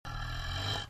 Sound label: Mustelidae